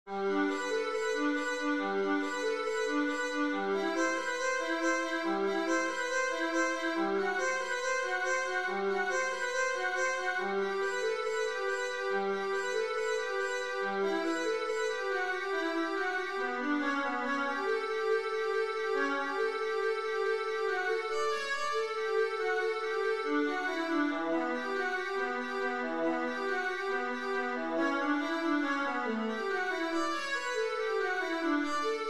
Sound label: prelude viola